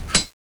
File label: stab, weapons